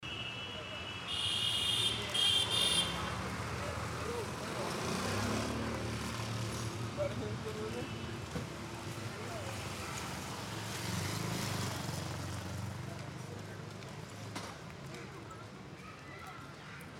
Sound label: free ambience public crowd